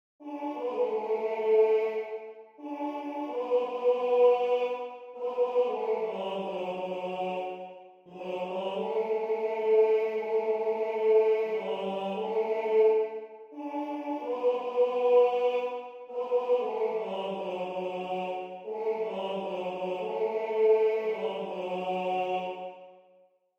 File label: TTBB BARITONE with other voices; audition excerpt